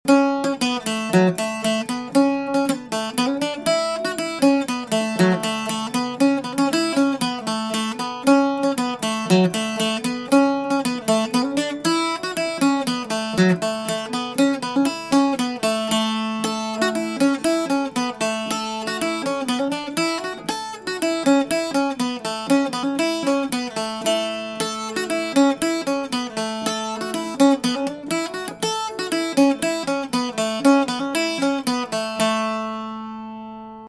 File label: Polka A maj; The Ballynahula